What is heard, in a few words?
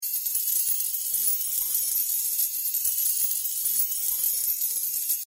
coin shower loop; Fluffy Arcade: Doubleup Ducks LuckyTap; Eyecon